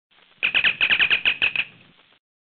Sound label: Communicator, Ringer; V710 Ringer